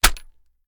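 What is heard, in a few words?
submachine gun reload empty hit mag; gmod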